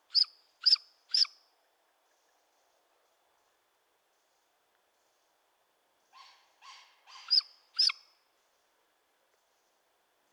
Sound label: Great Gray Owl, Strix nebulosa